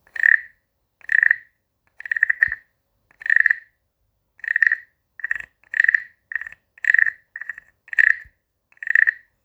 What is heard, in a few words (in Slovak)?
zaba mala, hracie zvieratko mala zaba